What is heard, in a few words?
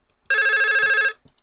Ring; Doro; PhoneEasy-337ip